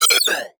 Multimedia Internet CD-Rom Flash Whoosh Multiple

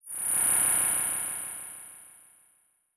Transition Delay Medium; Production Element Title Transition

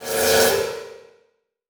Transition Whoosh Alarm; Production Element; Title Transition; AdobeCC

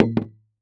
Impact PVC Pipe Drop On It's Side On Wood Floor

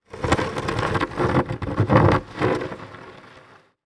Foley Movement Wood Drag Through Dirt

